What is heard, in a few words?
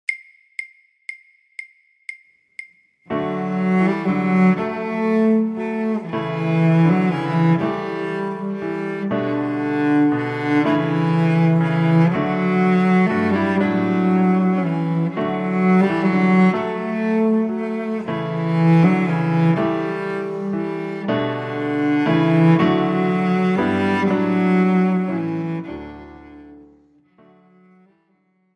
Andante Grazioso; Mozart; Aus der musikalischen Schatzkiste 1 - Violoncello